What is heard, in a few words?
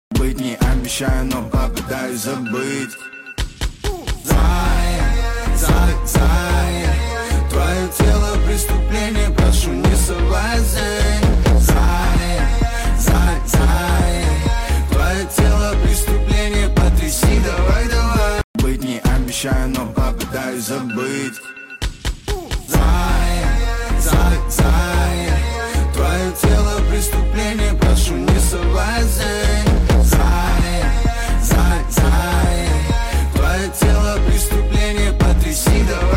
phonk